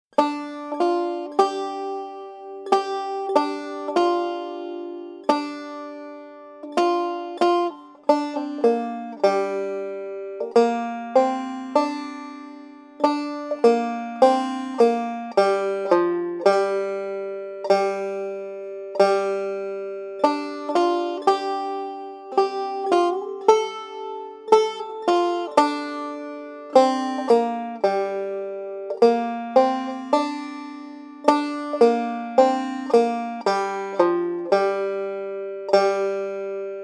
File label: Rain Snow Melody